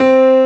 piano; Analogon

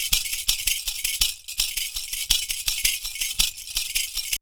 PERCU; Univers Sons; Raricussions

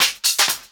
COMBI, Houseworx; Ueberschall; Workz-9

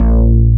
BASS, POWER SYN; AKAI S6000 CD-ROM - Volume 1; SAMPLE WAVE MIXING 2

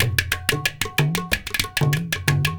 India, Heart of Asia

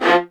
F3, LOUD VIOLINS; Q Up Arts - Denny Jaeger Master Violin Library

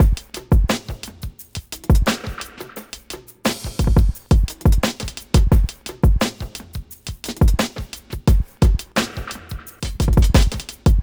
Drums, Hip Hop

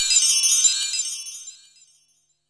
W CHIM, PERCUSSION

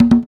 African Perc

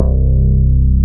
BAS MKS-50, Roland

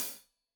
Hat Loose, Hi-Hat, Cymbals Stereo; Deeply Sampled Drums

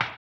HAMBONE, Vocal Blues Soul; Spectrasonics; Planet

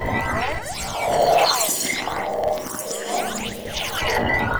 loop, techno atmos

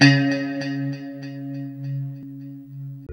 LO E C#3, Guitars; Spectrasonics; Hans Zimmer Guitars VOL-1; JC-SHEEN

